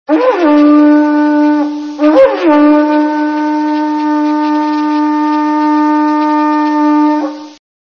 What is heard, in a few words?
seashell horn